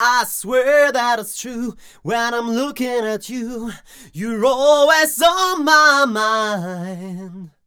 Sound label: m 128 e-flat, Vocals